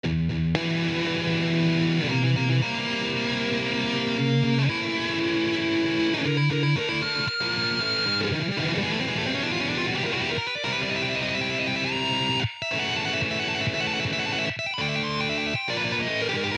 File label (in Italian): assolo, guitar